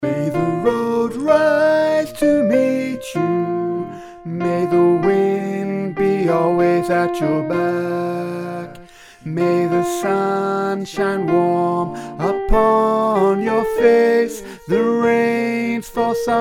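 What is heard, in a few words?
An Irish Blessing, soprano; classical-traditional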